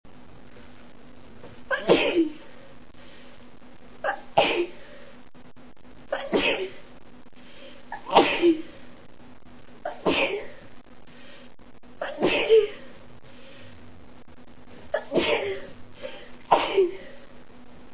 cold sneezes and blow, female

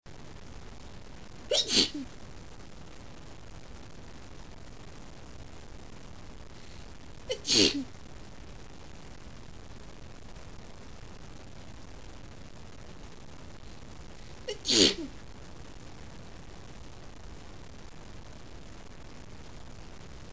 shy sneeze, female

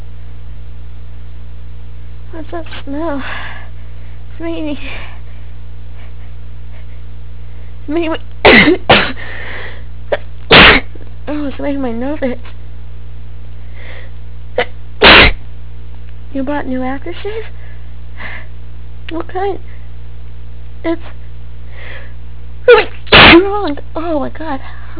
allergic to aftershave, female

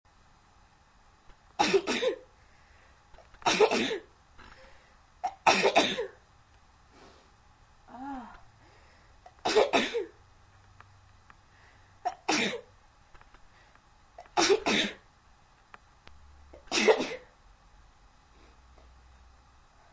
pollen attack, female

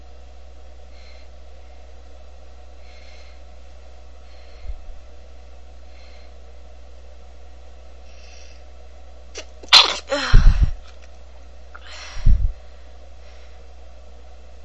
stifles, female

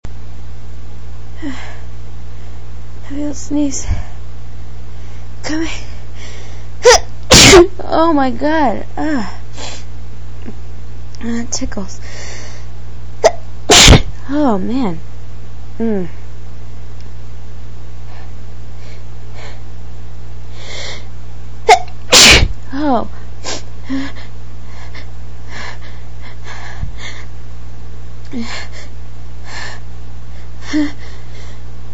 really strong sneezes, female

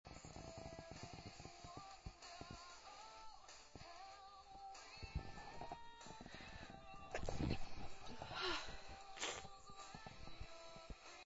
stifles once, female

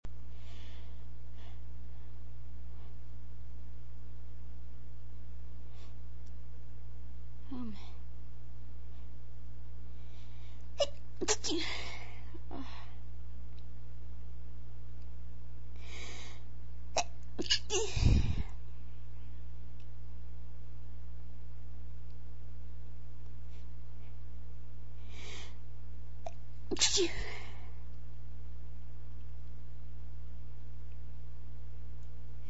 what is making me sneeze, female

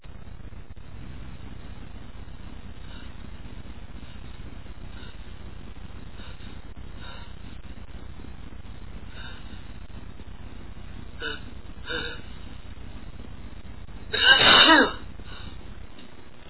dust, male, achoo

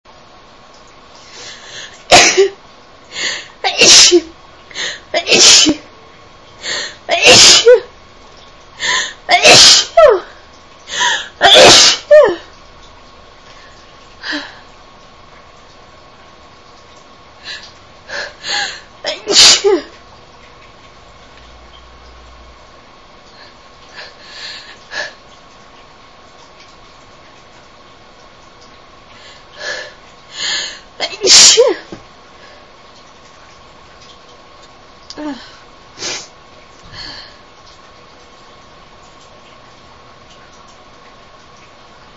sneezy, female

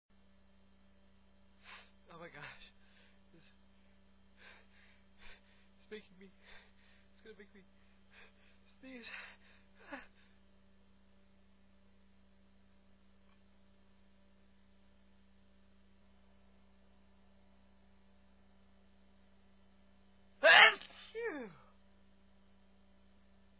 gonna sneeze, male

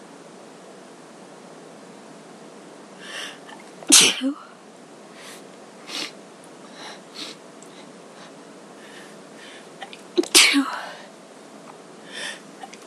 dusting, female

